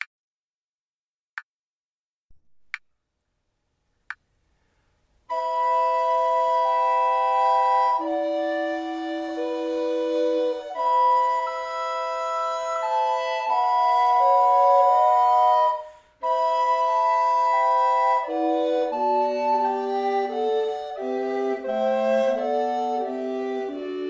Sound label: Huldufolk SATB, recorder